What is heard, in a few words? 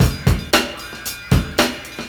breaks